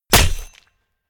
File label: hit; smite; ares v4 skin16